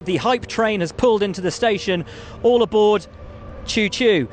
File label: choo choo